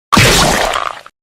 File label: splatter; object terror